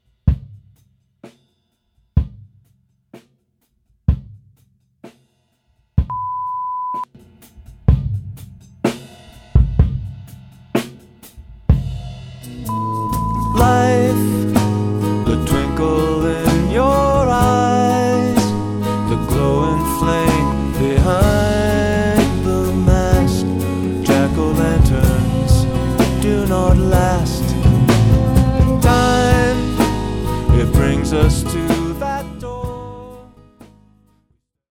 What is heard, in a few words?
Kick; Bones Howe